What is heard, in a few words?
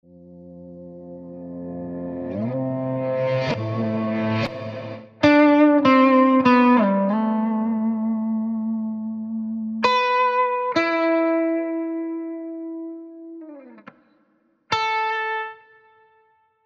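Guitars forward reverse; Scoring Guitars; Performance Palettes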